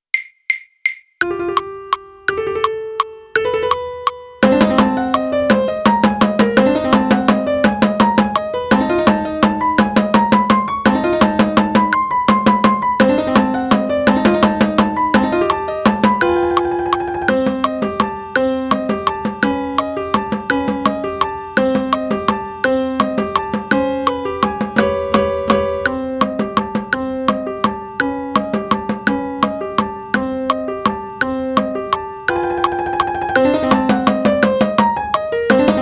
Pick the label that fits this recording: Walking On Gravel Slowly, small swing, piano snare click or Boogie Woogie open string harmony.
piano snare click